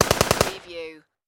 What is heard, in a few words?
Machine Gun AK-47